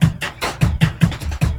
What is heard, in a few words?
LOOP, Drum & Bass, 150 BPM